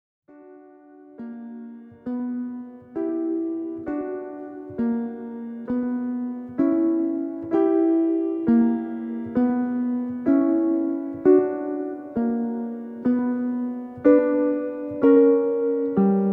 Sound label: Prelude in B minor, Keyboard Studies